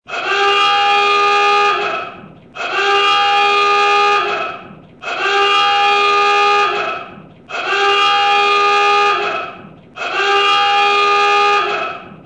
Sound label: Klaxon